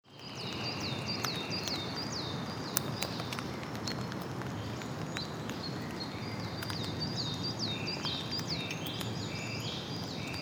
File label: forest drizzle on leaves